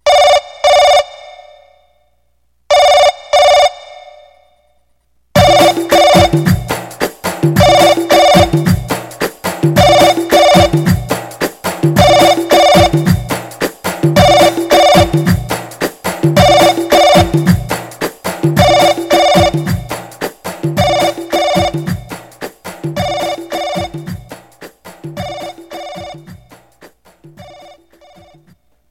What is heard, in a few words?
clasic ring n music; ringtones; sms